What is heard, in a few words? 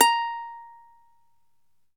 uke A#4